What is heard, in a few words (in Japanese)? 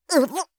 うぷっ; ASMR